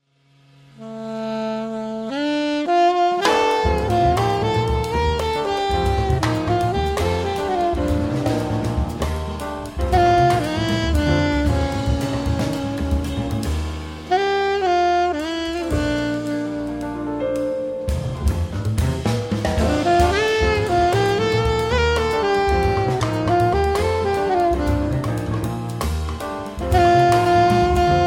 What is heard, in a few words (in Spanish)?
campanitas